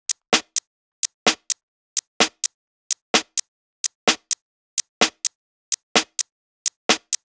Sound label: 128BPM Kit; Vengeance Samples; VFH1 128BPM Mini Space Kit